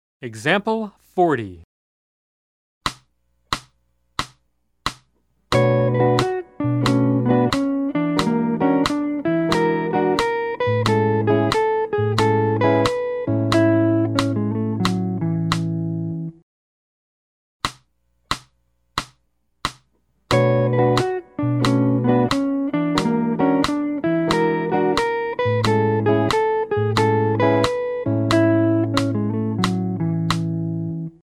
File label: Jazz